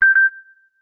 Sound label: Samsung ringtone Beep Beep